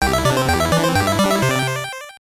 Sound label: Beat a Puzzle; barbie magic genie adventure; gbc